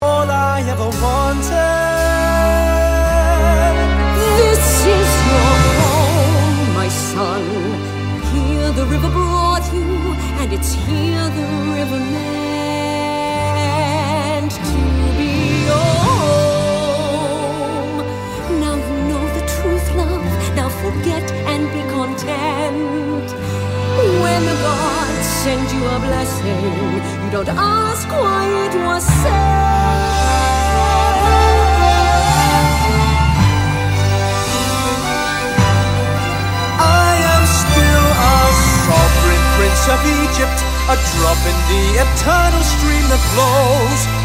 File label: Adult Female Cast Recording